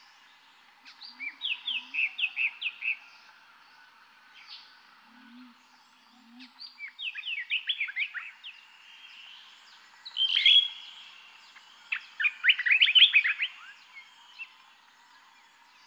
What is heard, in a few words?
day wood ambience